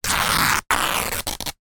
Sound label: foley shorts thin material rip tear